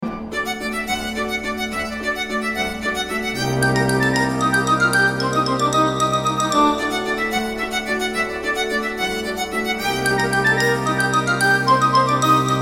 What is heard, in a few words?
Danse macabre xylophone